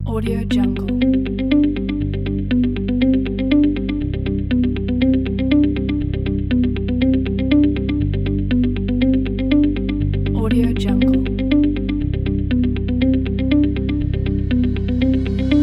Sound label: a corporate motivations; audiocenter